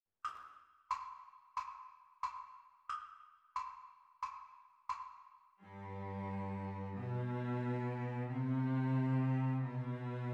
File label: Cello Duet Playalong; Exam Speed; The Teacher part